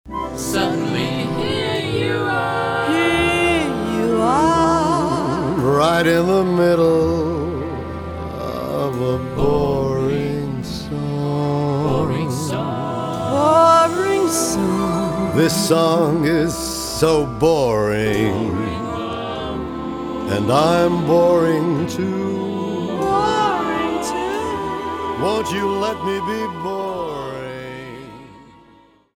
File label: Boring Song